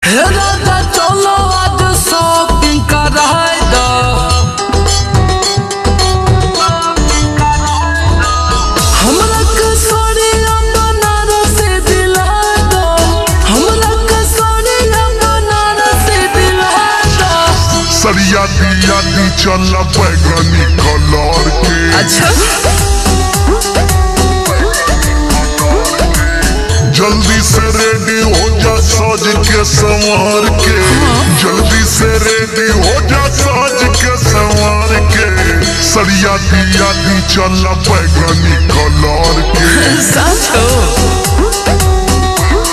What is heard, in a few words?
Ringtone slowed and reverb